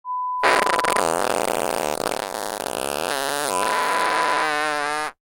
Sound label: Fart sound